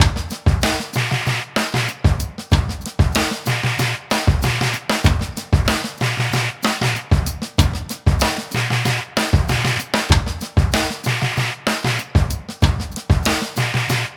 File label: Loop Jam 95 Bpm